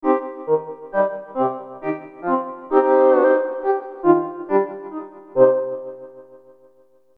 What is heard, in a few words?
formantik modelling, synthesizers